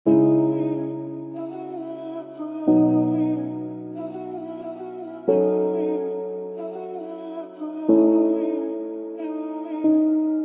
oriental dancehall type beat turkish reggaeton oriental balkan